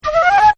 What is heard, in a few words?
FLUTE, Woodwinds; Sound Effects:Musical; Flute2